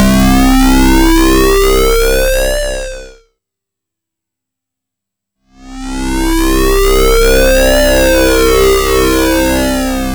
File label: C2, Synth Line; 90 s Sample CDs; NiceBeats; SynthLine VOL-21